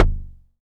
KIK, Pearl DRX-1

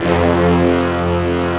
BRASS MIX; Best Service Advanced Media Trax Modern Composer

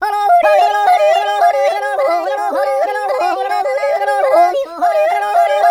YODEL; ILIO; Vocal Planet

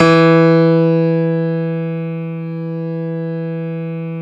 StereoGrand; E-MU Producer Series Vol. 2 – More Studio Essentials

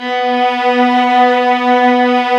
STR VAS, Vlas Sect, Amb f; String Master Series; Vlas Sect3-4

